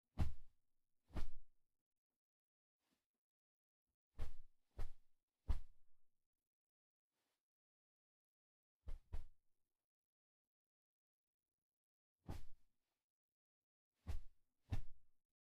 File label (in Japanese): 布団をぽんぽん叩く; KU100; 布団の音